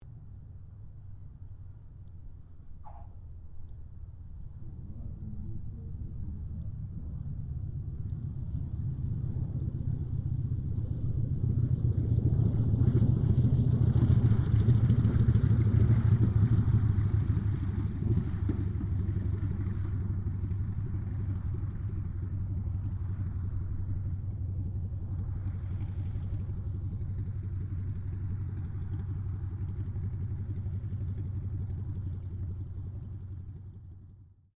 AIRCRAFT CAC WIRRAWAY LAND PA; CA-16 Wirraway; Airplane; Vehicles; Airplane Sound Effects

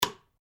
Switch Light Flip Japan Off

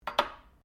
cutlery general place bench, Household cutlery

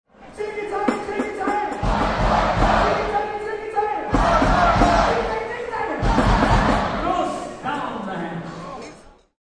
Grmn Tost, Lively German crowd toasting.; German Toast 2; Toasts

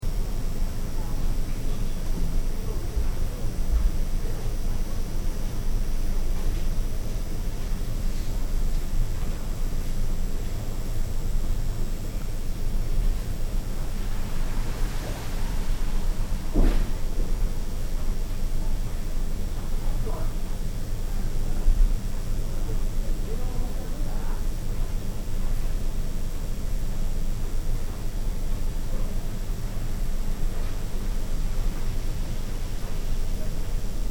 Industrial Factory Tokyo Empty Ambience; Various industrial machines; Machinery (Sound Effects:Machines)

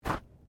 Body movement Slide; Body movement foley to simulate falls and deaths; Body Sound Effects; Bodies (Sound Effects:Human)

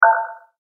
multimedia rollover, Multimedia Rollover Tone

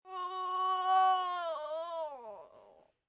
Groan Oooh, Moan, Groan, 1 child, intense pain; Groaning Sound Effects

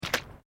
Footsteps Boots Asphelt Puddles Step; Footstep Foley; Human

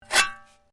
Shovel Scrape; Working with a shovel or spade; Objects

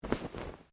Clothing Shirt Cotton Move Quiet

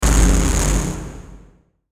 Hit Harsh Bass, Hit Harsh Bass Kick; Blastwave

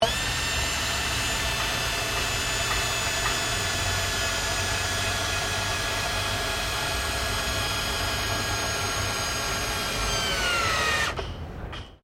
POWER TOOL CORDLESS DRILL SCREWDRIVER; Various power tools

